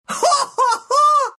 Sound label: laugh, ru